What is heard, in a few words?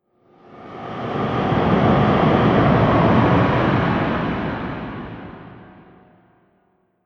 Ghost, horror sound effect; Andrew Fleming